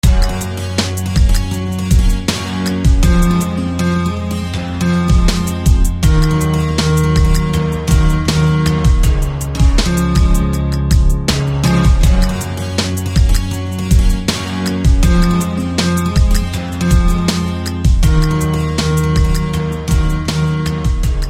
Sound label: Guitar; Storyman; SFB FX